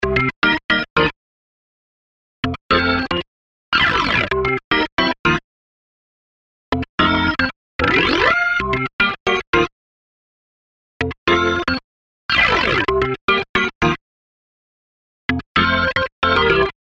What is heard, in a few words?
Sliders Ringtone, Organ; HTC